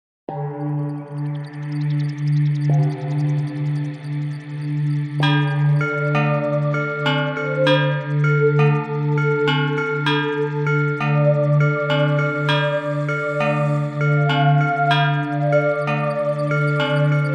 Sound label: Water Clock